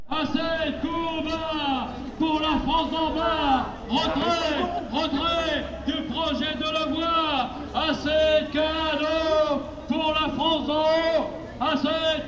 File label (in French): Slogan Cadeaux France Haut Cgt; Manif Rouen